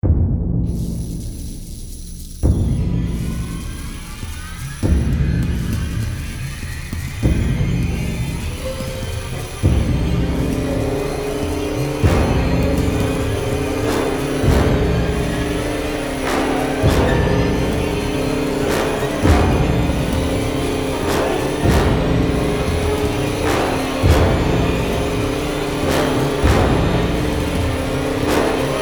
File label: ominous forces